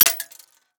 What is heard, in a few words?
pick break, lockpicking